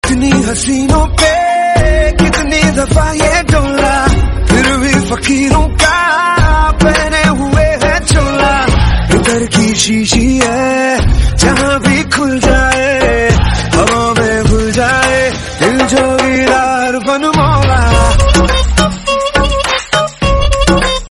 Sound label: bollywood song tone ringtone